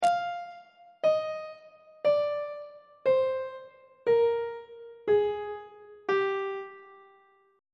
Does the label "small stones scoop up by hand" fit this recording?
No